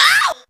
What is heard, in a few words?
Vocal Oneshots; Vengeance Samples; Vocals